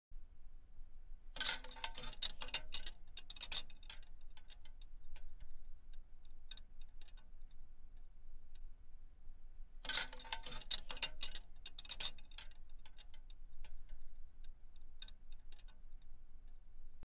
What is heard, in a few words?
clothes hanger; چوب لباسی